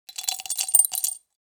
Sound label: Glass medicine jar putting pills inside